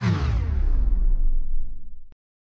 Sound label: powerdown; sorcery